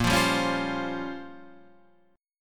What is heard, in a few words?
A sharp Minor 6th Add 9th A sharp, Guitar chord; A#m6add9 Chord {6 8 5 6 6 8} strummed